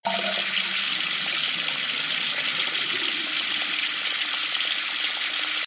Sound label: water flow